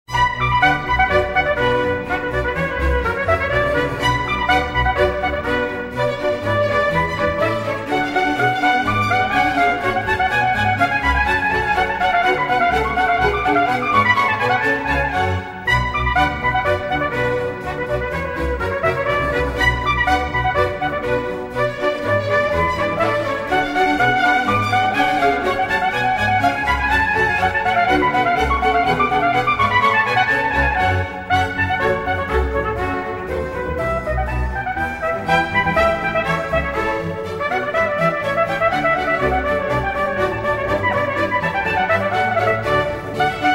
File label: Orchestral Suite in B Minor, Badinerie (Arr. for Trumpet; Bach; BWV 1067